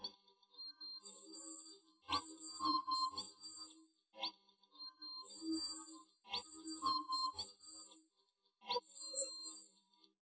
no drums, 130ms, 4cm

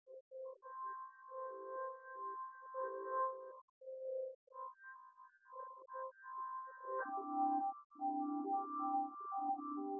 nodrums synthconv; SiSEC08; SiSEC underdetermined

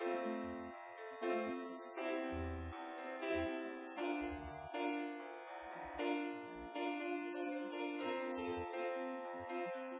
nodrums inst; SiSEC08; SiSEC underdetermined

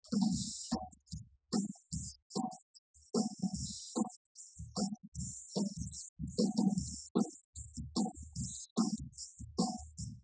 wdrums; SiSEC10; underdetermined

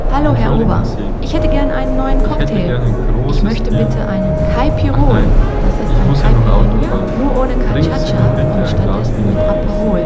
Cafeteria

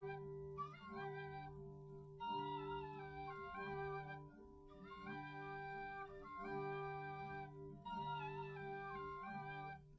no drums, live rec; SiSEC11; underdetermined

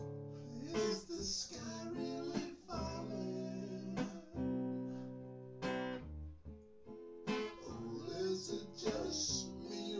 nodrums synthconv 250ms 1m mix; SiSEC10; underdetermined

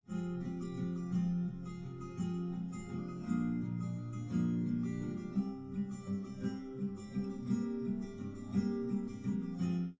nodrums liverec 250ms 5cm; SiSEC11; underdetermined

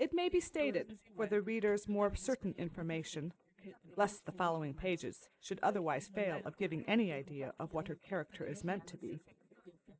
female inst src

